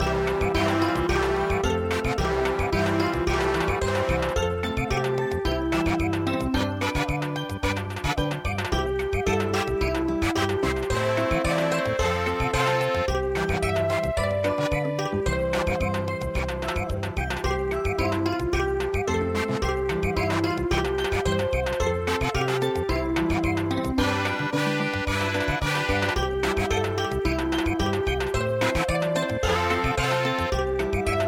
spring season has come Samba

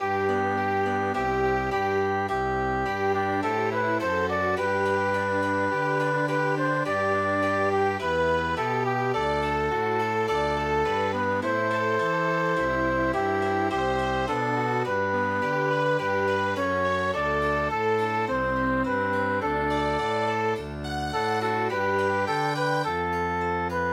Chamber Music

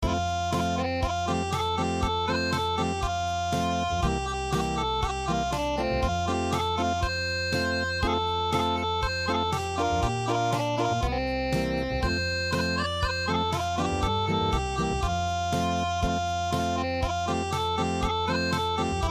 Orin Tonm Norepurudya Unplugged

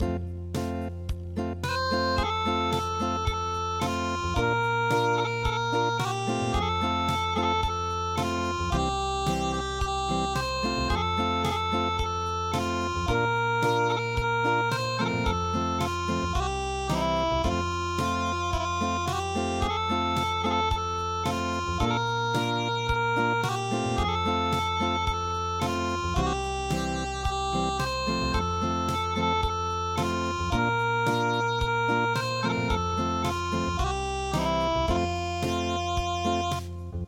Unplugged

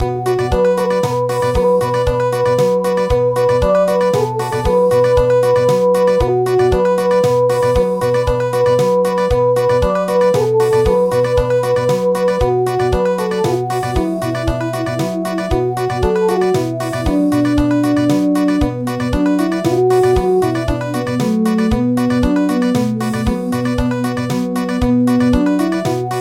Country, midi

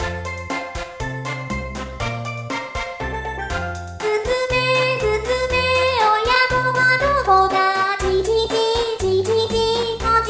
sparrow house, traditional vocal; 童謡・唱歌; 鏡音リン

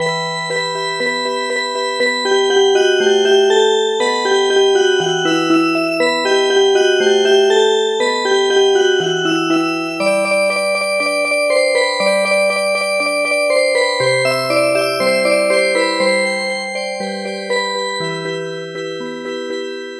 Turkmen Music Box; midi; traditional

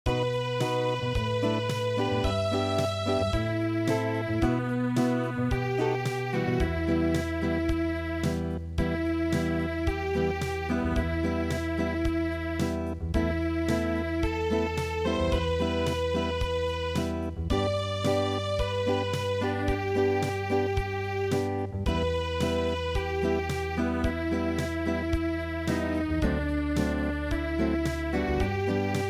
Acoustic

